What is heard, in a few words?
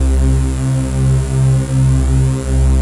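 Rave Synth, Dance Mega Jungle Rave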